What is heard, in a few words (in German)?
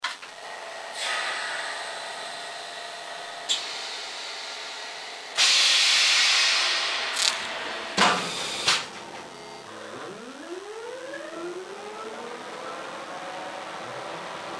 Elektrolokomotive; Roco 73273; 465 018; BLS